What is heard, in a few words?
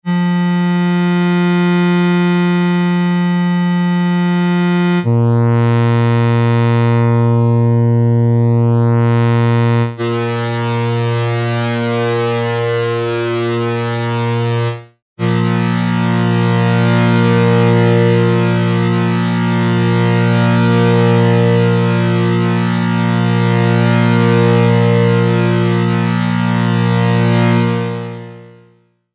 buildup